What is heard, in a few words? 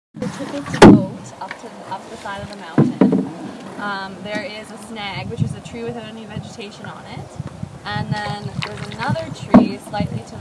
on the water sounds